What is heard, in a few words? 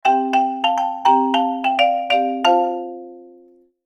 marimba ringtone